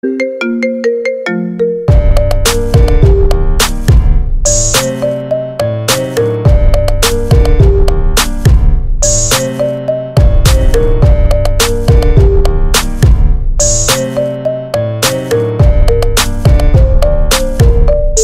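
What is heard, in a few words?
iphone marimba ringtone, apple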